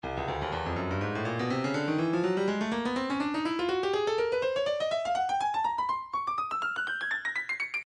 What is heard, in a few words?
Percussion Bongos, midi; Stylus